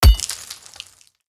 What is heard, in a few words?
axe mining ore; Factorio